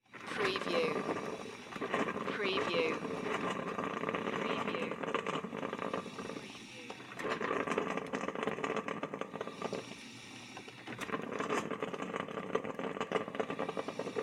Coffee Brew; sound effects bible

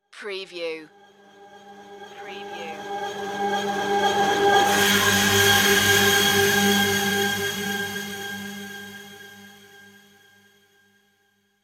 shiny gleam revelation

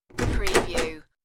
Old Door Opn

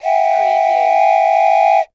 Toy Train Whistle Blow

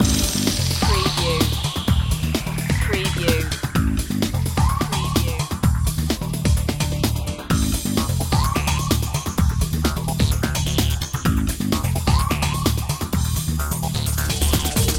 funky loop

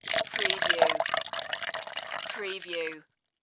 Pitcher Pour Plastic

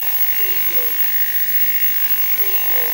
TV Static loop

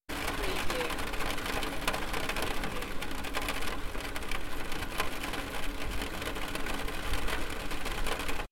Rain on a plastic roof light; beanstalk audio

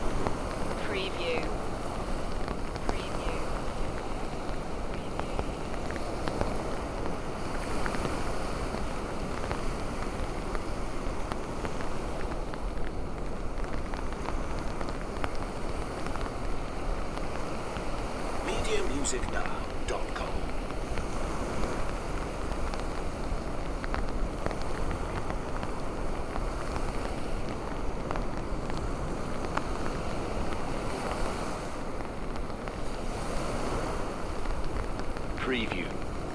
Rain on a hood near the sea loop

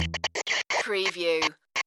loop break

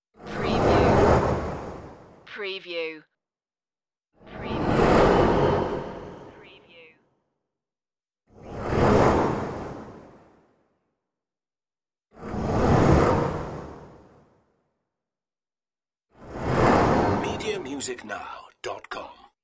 unguided spaceship flybys

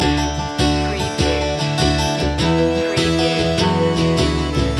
circus loop

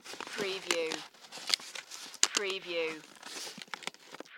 Envelope Manila Close; Sound Effects Bible

